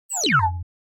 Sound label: Small robot talking style beeping